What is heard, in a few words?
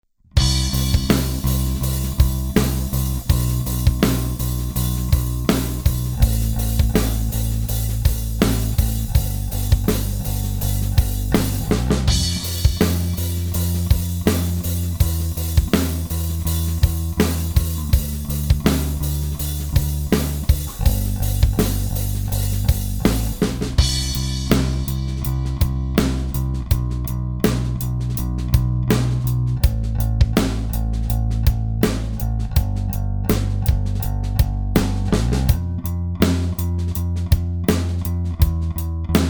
Drum; Hikaku; bfd3